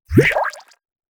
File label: First Jump